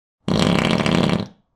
Long wet squelchy fart

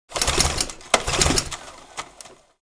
Lawn mower start fail engine; household

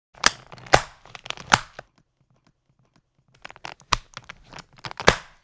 Ski boots put on

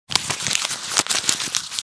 Paper sheet crumpling rustling

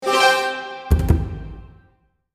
Empire game reward quest complete

